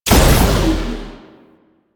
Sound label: Shot, Weapons; Strun Prime